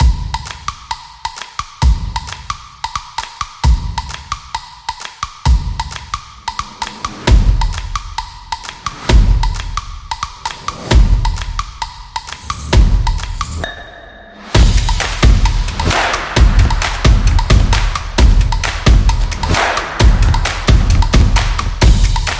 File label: Background Beat